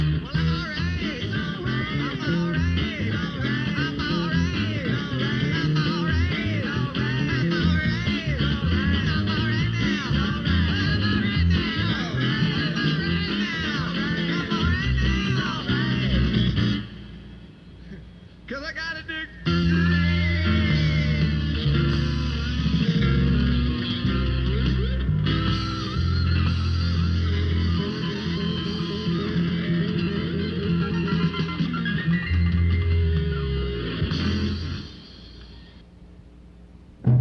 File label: Alumni Blues; Burlington, VT; Halversons